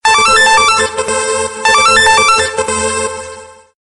Emergency Call

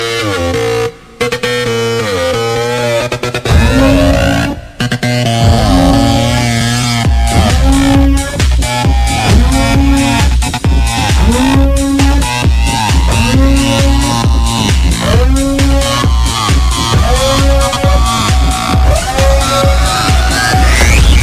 groove; Transformers3

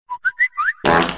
fart

